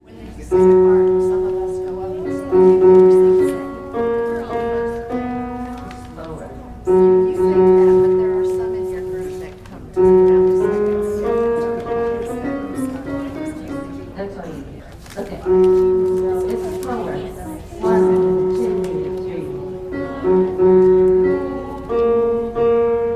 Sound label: bass